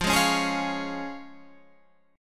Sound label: F Minor Sharp 5th, Guitar Standard, chord; 13,11,11,13,14,13; Fm#5 strummed; JGuitar